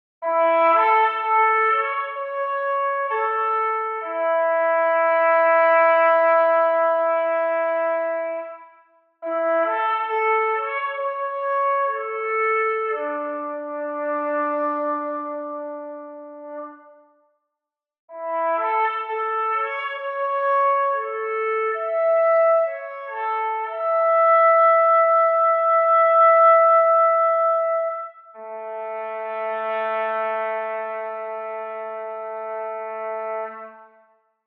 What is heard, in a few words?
Trumpet Solo; Apollo 13